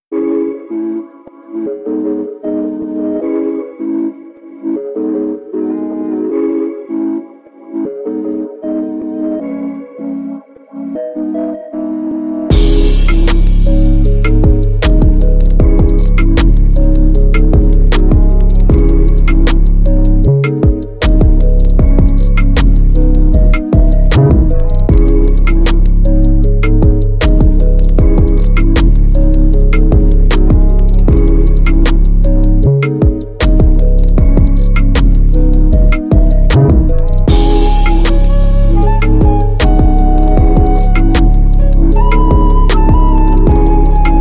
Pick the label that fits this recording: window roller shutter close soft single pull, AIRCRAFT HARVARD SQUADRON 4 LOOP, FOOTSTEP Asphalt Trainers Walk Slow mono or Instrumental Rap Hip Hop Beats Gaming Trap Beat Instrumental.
Instrumental Rap Hip Hop Beats Gaming Trap Beat Instrumental